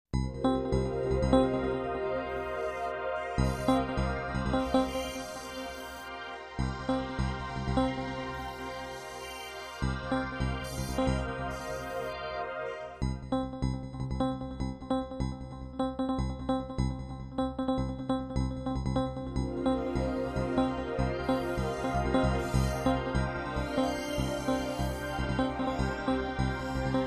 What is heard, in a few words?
High Pulse Light High Tech